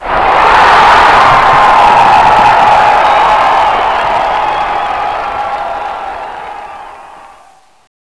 ROAR

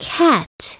cat; SoftKey; WordBank

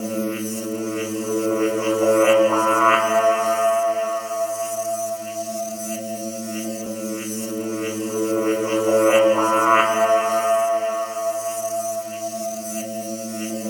buzz Flange, Cycles; Future Music

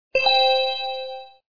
good sound; barbie is hungry

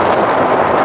skid grav; REDLINE

